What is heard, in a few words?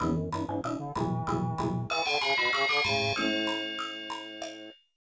Unused Jingle; pac man 2 the new adventures 1994 snes